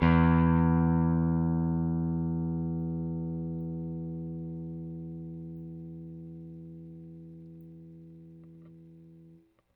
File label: Rickenbacker Bass; Airwindows